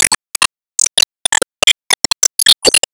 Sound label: Enemy Poison wizard Apple Stir